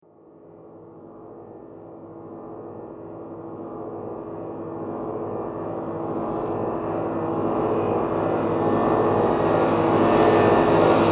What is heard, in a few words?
Evil Air Of Darkness, evil sound effect; horror sound effect; Andrew Fleming; Twistedtracks